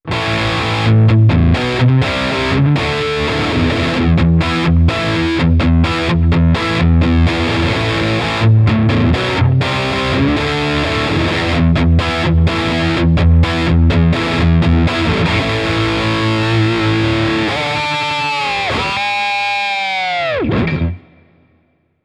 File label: Quad Cortex Highgain Rhythm